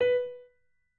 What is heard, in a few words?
piano